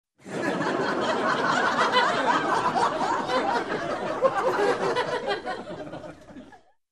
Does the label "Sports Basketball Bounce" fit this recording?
No